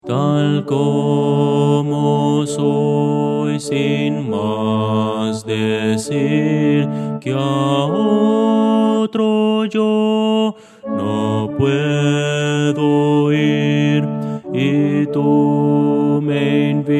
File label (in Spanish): bajo